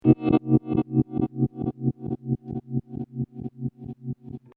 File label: rewind